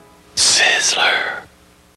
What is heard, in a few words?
sizzler